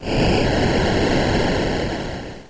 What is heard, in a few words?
roar; doublespeakgames